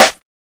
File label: 00s present snare drum sample g key; Mono; snare drum samples